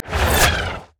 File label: charged shot; LoL; graves